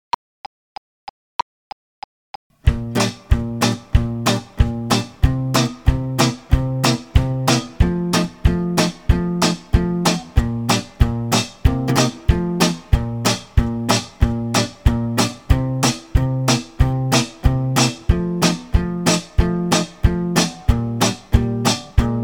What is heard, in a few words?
two beat